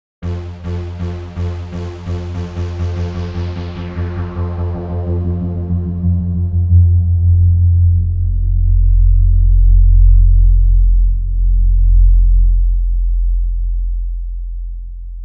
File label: Acceleration Into Cutoff; Horror Sound Pack